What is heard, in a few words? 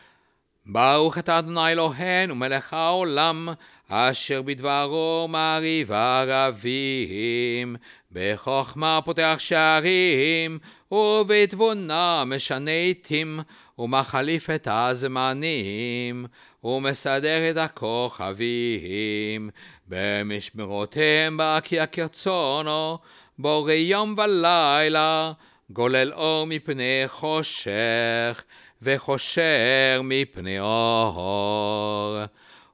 Barekhou Creation; la liturgie du chabbat; offices de chabbat